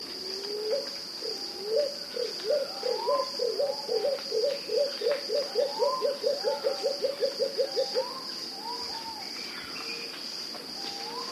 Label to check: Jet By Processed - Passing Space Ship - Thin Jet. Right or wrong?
Wrong